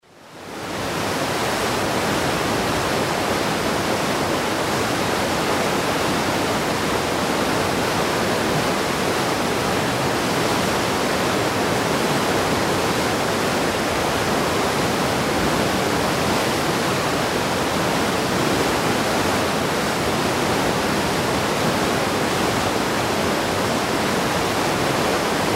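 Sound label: ocean liner propeller noise at the stern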